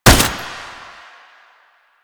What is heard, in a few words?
Tank Turret Shot; Pintel; Weapons; Warframe; Lasrian